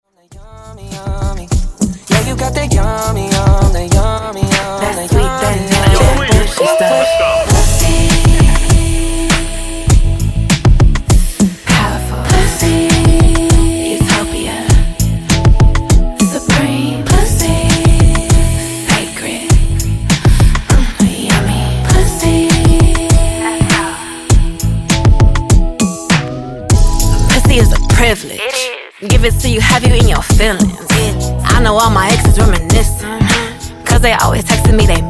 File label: Acapella Intro Outro, 100Bpm